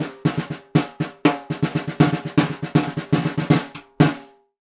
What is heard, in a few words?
Vintage Ludwig Snare, Percussion; Continental Drift Aftershocks